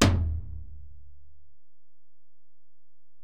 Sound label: Tom, Alesis DM-PRO